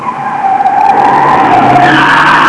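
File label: SKIDDING, Transportation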